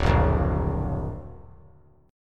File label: D Augmented D down; D+ strummed; JGuitar